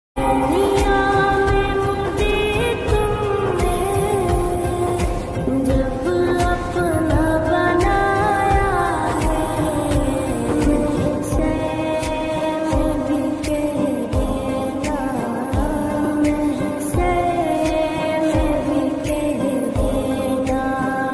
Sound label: duniya me mujhe tumne jab apna banaya hai islamic ringtone pakistani sunni ringtone